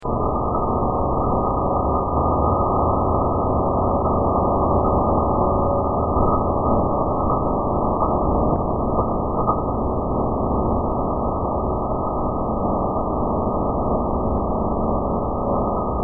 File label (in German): metall tonabnehmer